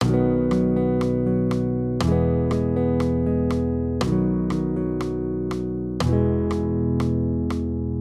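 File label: Guitar, Accompaniment, Major Triads, B-E, 120 BPM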